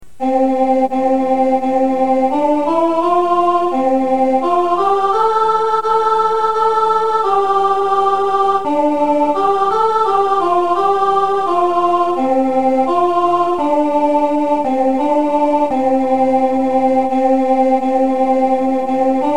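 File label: away in a manger alto; Christmas; carol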